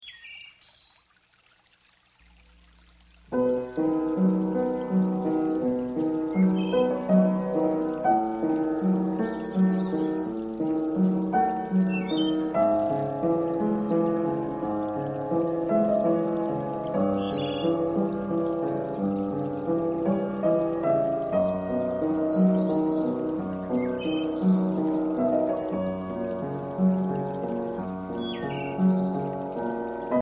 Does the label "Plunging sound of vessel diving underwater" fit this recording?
No